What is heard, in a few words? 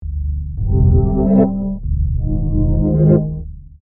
Alien Spaceship, Scifi, Alien, Spaceship, Tones, Science, Fiction; Blast Wave